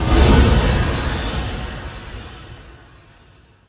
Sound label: Blast